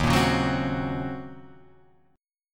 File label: Eb Minor 13th, Ebm13 chord; strummed; JGuitar